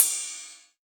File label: Ride Cymbal; Best Service; House Definition VOL-1; Cymbal Stuff